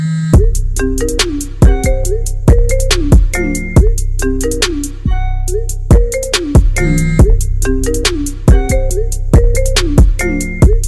iphone 13 pick up

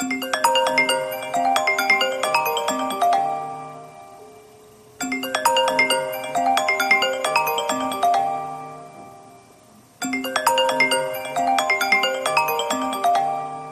iphone 12 pro max incoming

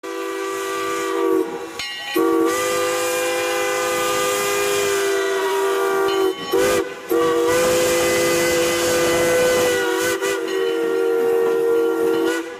Steam Train Whistle

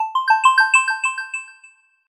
HTC Touch Pro2 And Diamond2 Stock Notification

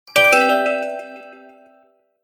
Diode, Stock Notification; HTC 10 - Sense 8 Sound Suite

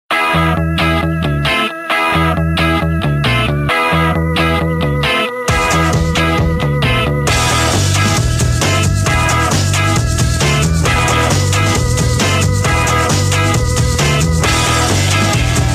nokia 5110

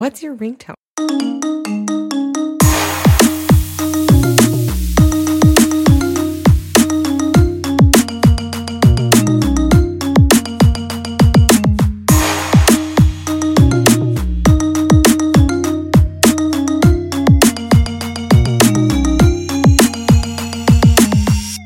iphone 11; Electric Kiss

